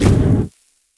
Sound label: Fire Tank Footstep; AudioWizards